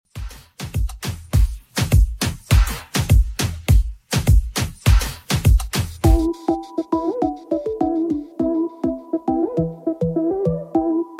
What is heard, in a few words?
Moombahton 102bpm